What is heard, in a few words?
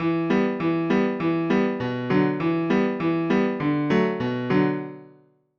Piano